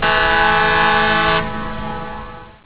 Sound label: ferry horn